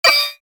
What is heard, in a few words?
Digital system error